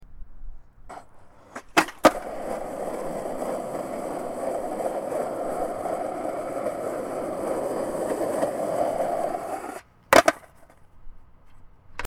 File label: skateboard